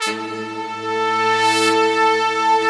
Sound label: big brass, Slow Big Brass